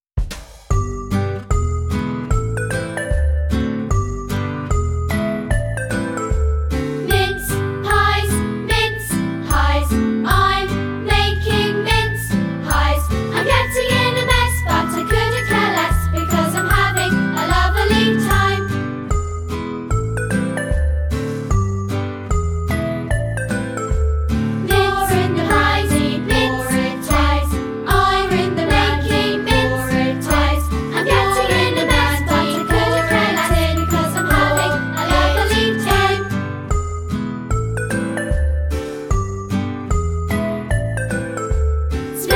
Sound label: Mince Pies Vocal